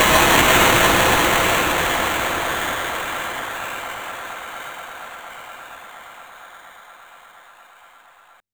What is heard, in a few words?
FX Downlifter